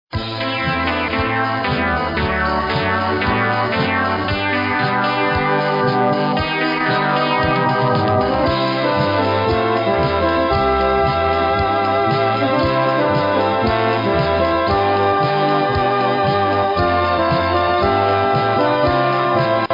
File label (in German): Happy Posaunen